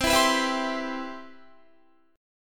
C Major 7th, Guitar

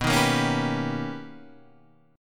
B Augmented Major 9th B, chord; B+M9; strummed; x,x,9,8,10,8,9,8; JGuitar

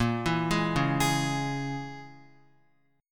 Bb Minor 7th Flat 5th, Guitar; Bbm7b5 arpeggiated

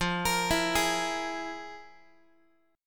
F Minor Major 11th, Guitar; FmM11; arpeggiated; x,13,13,14,12,x

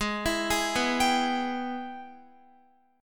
Ab Minor Major 7th Sharp 5th, down, Guitar; arpeggiated; AbmM7#5

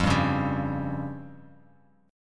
F Suspended 2nd Sharp 5th F; Fsus2#5 strummed; JGuitar

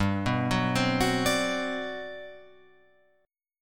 G Major 11th, Guitar Open F; arpeggiated; JGuitar

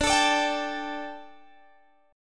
G Suspended 2nd

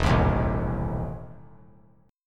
E Major 7th Suspended 4th Sharp 5th; EM7sus4#5 strummed

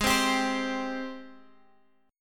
Ab Major 7th Ab, Guitar Drop D; strummed; x,11,10,12,13,x; down

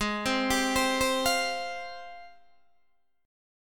G sharp Major 13th, Guitar Open D

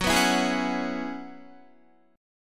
Gb 7th Suspended 2nd Suspended 4th, Guitar

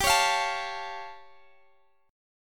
A Augmented 7th G

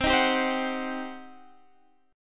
G Major 7th Suspended 4th Sharp 5th, C

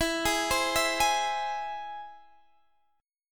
E Augmented, Guitar, Standard; E+ arpeggiated; JGuitar

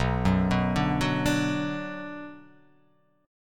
B Minor B

